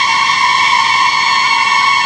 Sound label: stabs & hits